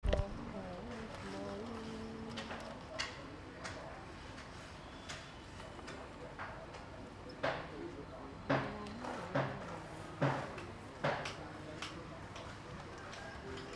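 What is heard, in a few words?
ambient morning drills, Cambodia phnom penh; 2019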